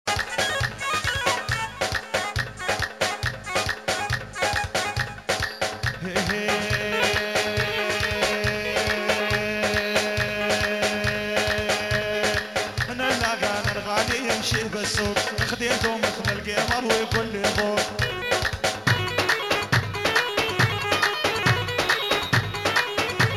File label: Live Arabic Dabke